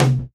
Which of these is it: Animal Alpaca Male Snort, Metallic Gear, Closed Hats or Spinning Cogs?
Closed Hats